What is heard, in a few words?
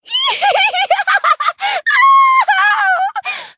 laugh; Lux